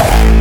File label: hkick A4